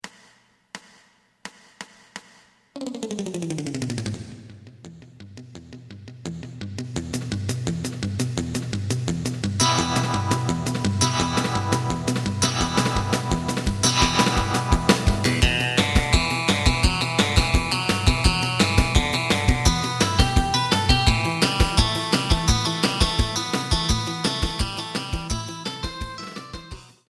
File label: bass